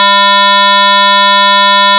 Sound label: sine